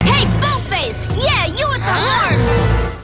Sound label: moon horns